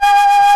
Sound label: HARPSICORD